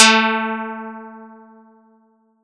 Audacity pluck 81 80

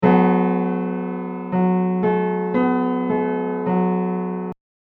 Tiny triad

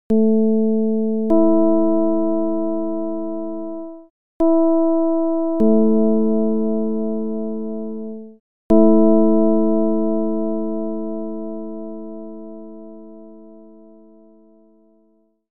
Ji 112-75 csound foscil 220hz

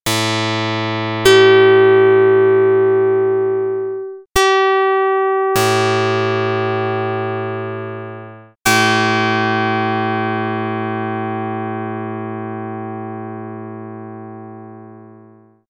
pluck

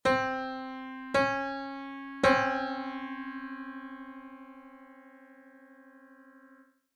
Piano 15edo

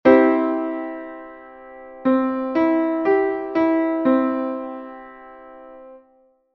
C (19-EDO)

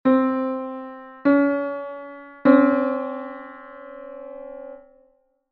0-133,33 minor second (9-EDO)

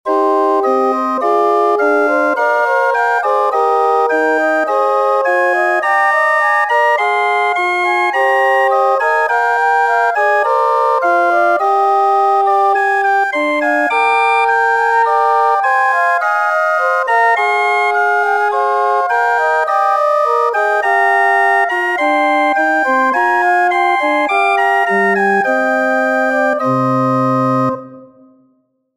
Blackdye Aeolian Example, 27edo

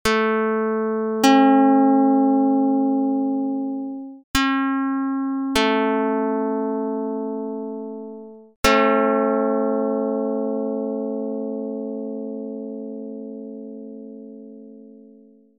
pluck; dr220

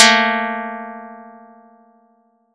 Audacity pluck 1 12

